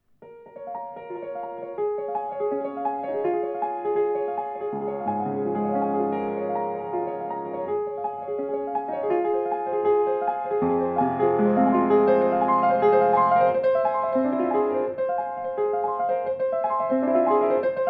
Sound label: Grotrian